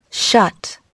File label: shut